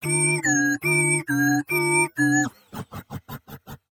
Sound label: excited beeps; 守望先锋2; 堡垒; 局内触发